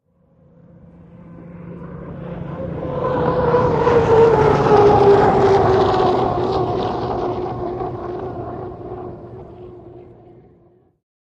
Hydroplane By Fast

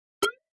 Button Elect Pulse

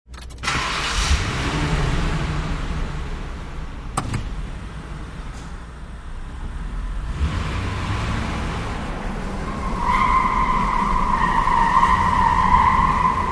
Lincoln Towncar Star

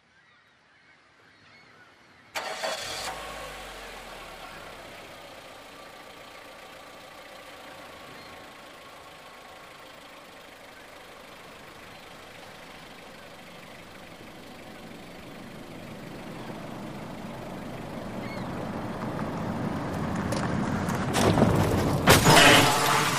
Vehicle Struck By An

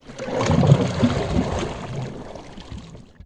Canoe Paddle Stroke Underwater

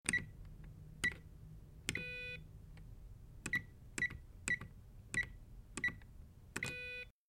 Alarm Keypad Entry; hi-tech-various

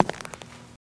FS Male On Hardwood, singles wood

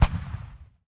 M155 Howitzer, shots

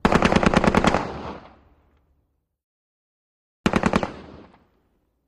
AK47 Short Bursts Imp

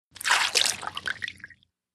Sml Spills Liquid, splashes

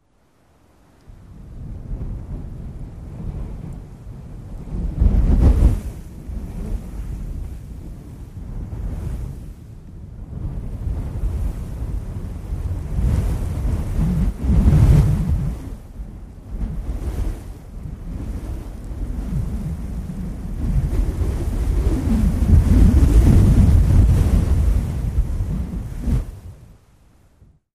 Gusts Heavy Wind Whip, sandy desert; Heavy Wind Whip Sand And Grass.

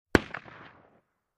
Elephant 477 Pistol

